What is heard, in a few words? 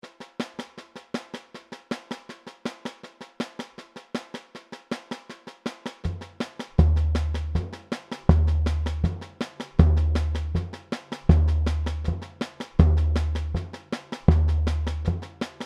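marcacao variations, samba; Pirate Samba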